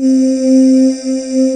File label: VOICES C4, Choir; USB Soundscan vol.28 - Choir Acoustic & Synth; AKAI